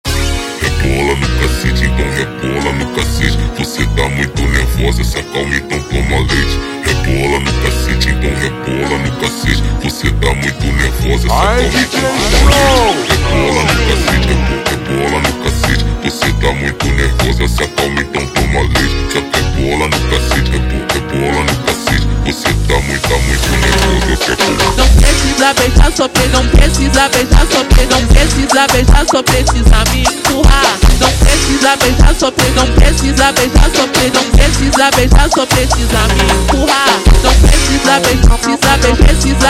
ultra Slowed, funk

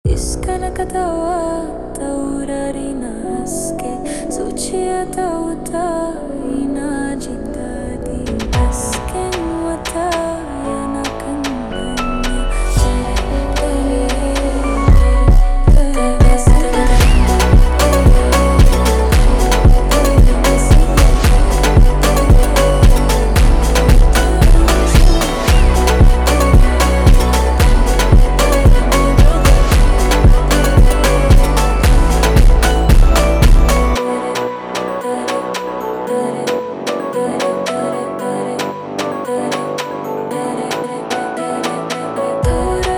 Funk slowed, funk

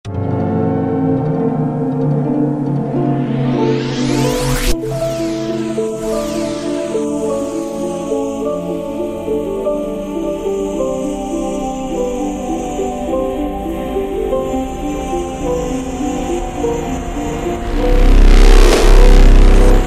Hardstyle ultra Slowed

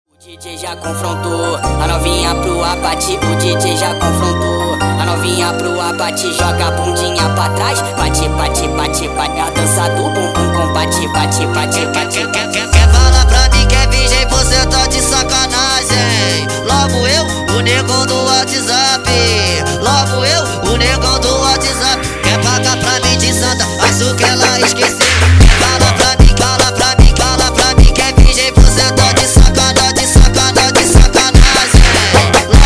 slowed, funk

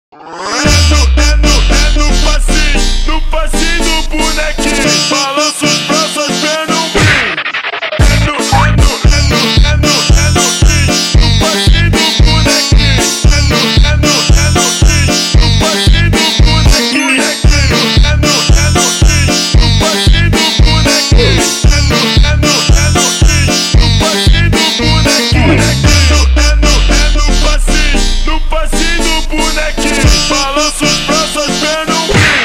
Funk slowed

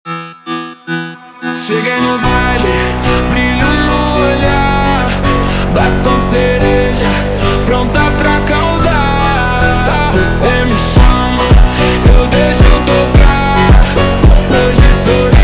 slowed, funk

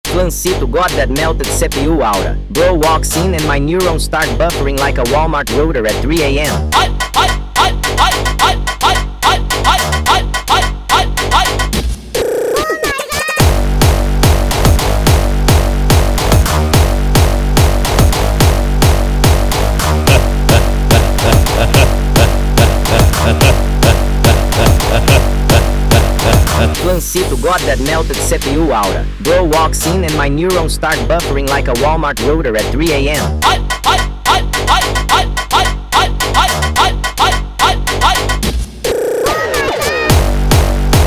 Hardstyle mega Sped Up

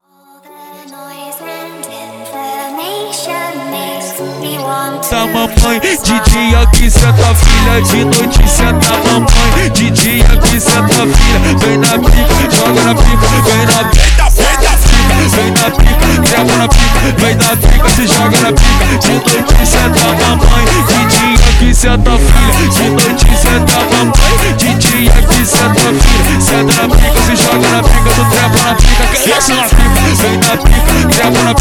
Brega Slowed, funk

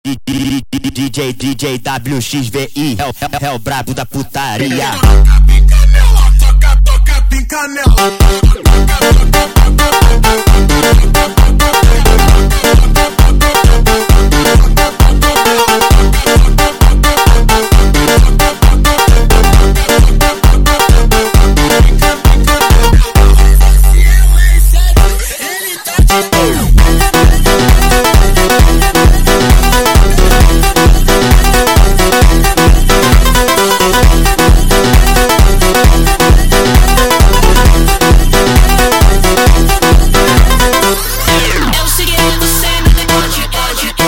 sped Up, funk

